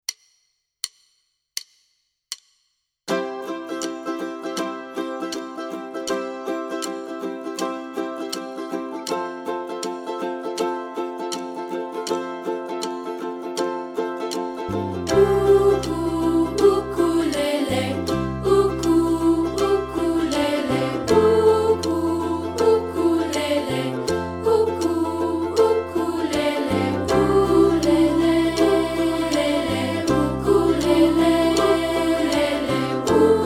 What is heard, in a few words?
ukulele